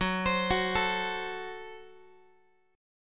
Gb Diminished Guitar; arpeggiated; JGuitar

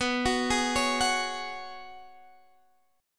Gb Suspended 2nd Suspended 4th B

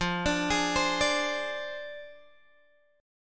F Minor 6th Guitar Open D; down; chord shape

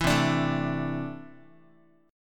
C 6th Add 9th C down Guitar; strummed; JGuitar

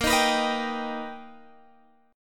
B Augmented Major 7th A sharp, chord

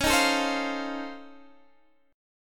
F 7th C sharp; F7/C#; strummed

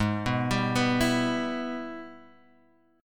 Minor Major 7th Sharp 5th, Guitar, Open G; Gsharp; 6,4,5,4,5,x

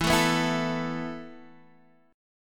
E Suspended 4th E down Guitar Half Step Down

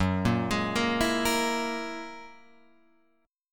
Gb 9th Gb down Guitar Open F; arpeggiated; JGuitar

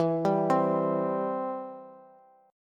E-Minor Sharp 5th, Bass; Em#5 arpeggiated; JGuitar

